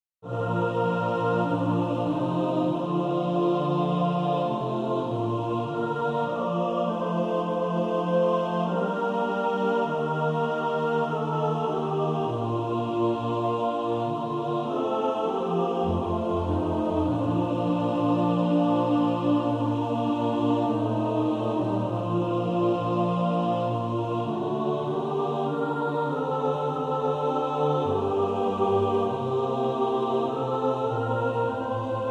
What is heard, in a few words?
mixed